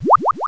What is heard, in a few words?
BUTTON